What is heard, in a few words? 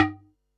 tom, Pearl SC-40 Toms